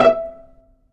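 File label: STRING PIZZICATO E4, Strings; STRING SECTION; AKAI S6000 CD-ROM - Volume 1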